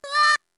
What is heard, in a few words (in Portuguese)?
rabbids com medo